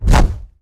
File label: Whoosh Arm; Evolve; Behemoth